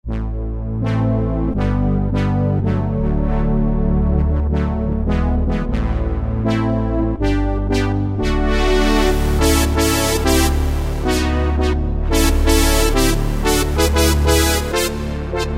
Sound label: The Analog, Roland JD-990; Factory Patches